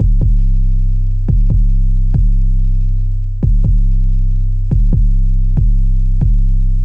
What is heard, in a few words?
808 Bass C 70 bpm; Opulent